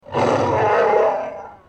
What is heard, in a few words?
Growl